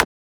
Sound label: Transient; FLPRO